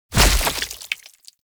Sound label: Dismember Gore Impact; Warframe; Weapons; Bullet Impacts